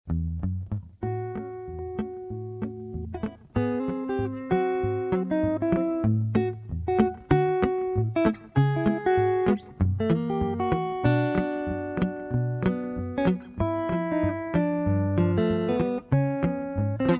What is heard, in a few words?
meditation